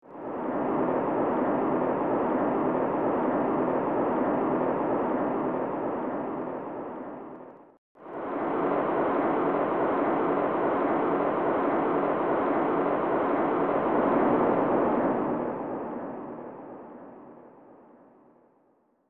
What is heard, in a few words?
Crowd Ambience; Super Goal 2; SNES